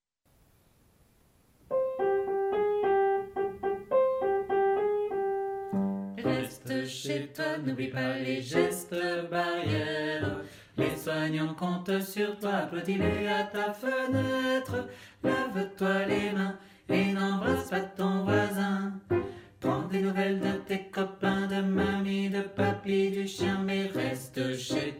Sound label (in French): canon; anti corona; alsace